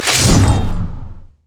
martial cadence attack; LoL; jarvaniv

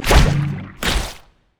emote respawn; LoL; zac base

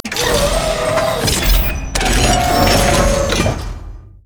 respawn; LoL; viktor skin03

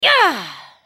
spell cast effort; LeBlanc

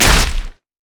bola strike; rengar

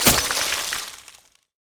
ice shard; LoL; lissandra

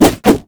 bear basicattack; LoL; annie base

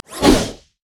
steam golem crit attack; LoL; blitzcrank

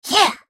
effort; Fizz

